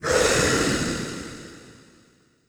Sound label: Ice Death; Ice Death V O